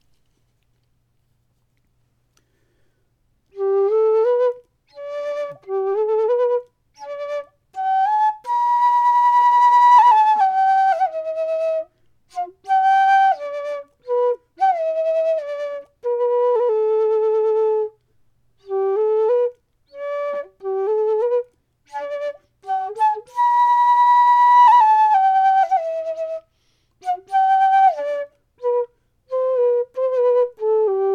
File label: flute